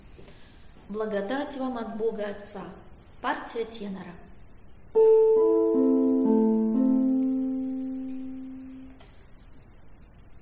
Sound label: tenor; blagodat vam ot boga otca